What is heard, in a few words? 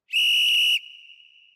whistle